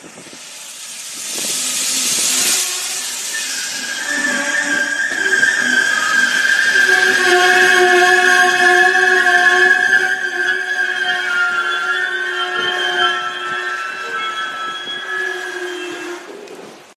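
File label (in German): kreischende Bremse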